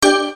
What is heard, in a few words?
choice symbol; games; Book Of Fortune